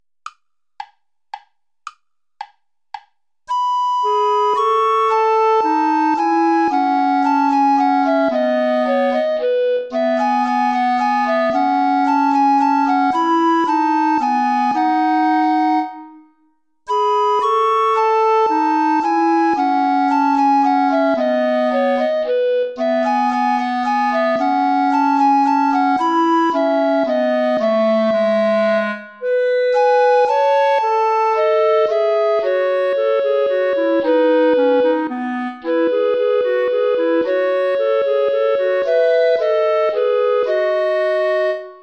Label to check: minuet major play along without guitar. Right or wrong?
Right